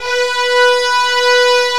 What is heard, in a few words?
VIOL E3, VIOLINS; AKAI S6000 CD-ROM - Volume 1; Strings